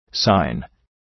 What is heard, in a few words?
sine